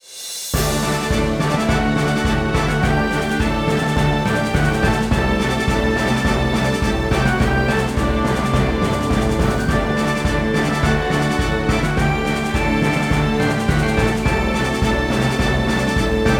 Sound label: Victory Quarter-Final